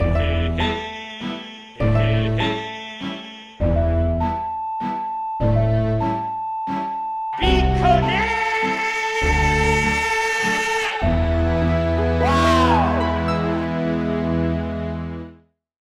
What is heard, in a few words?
Minuet; video game music to do your taxes to 2018